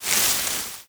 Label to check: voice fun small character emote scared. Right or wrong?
Wrong